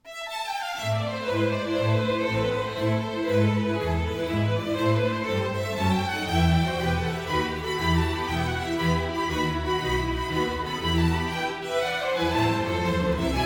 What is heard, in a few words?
Concerto for Violin in a, Allegro assai; Violin Concerto No. 1 in A minor, BWV 1041; Johann Sebastian Bach; Capt. Michelle A. Rakers, conducting; MGySgt Claudia Chudacoff, soloist; Performed by the Marine Chamber Orchestra